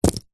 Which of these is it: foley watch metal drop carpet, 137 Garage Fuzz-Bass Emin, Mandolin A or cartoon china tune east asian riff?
foley watch metal drop carpet